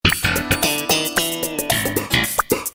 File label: sitcom transition bass riff; seinfeld